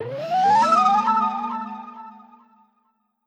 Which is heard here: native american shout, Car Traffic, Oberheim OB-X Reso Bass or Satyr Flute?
Satyr Flute